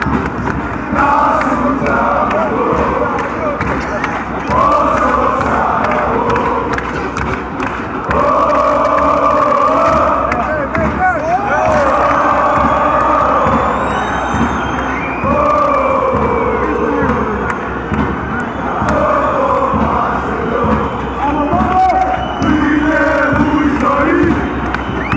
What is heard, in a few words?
ooooh olympiakos